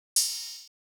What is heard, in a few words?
Percs